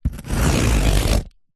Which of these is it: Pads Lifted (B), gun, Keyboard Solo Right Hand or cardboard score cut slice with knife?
cardboard score cut slice with knife